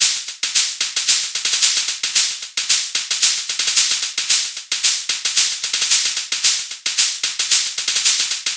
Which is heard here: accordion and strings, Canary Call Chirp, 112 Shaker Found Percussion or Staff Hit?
112 Shaker Found Percussion